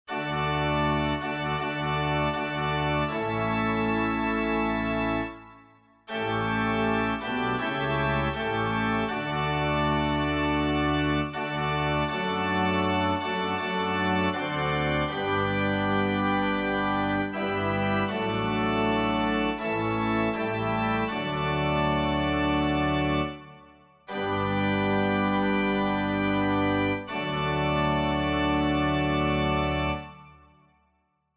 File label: hear our prayer o lord organ